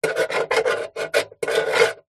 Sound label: office pen biro draw shape on metal box